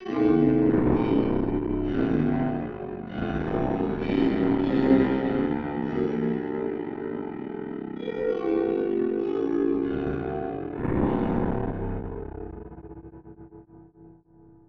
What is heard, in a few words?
Horror Piano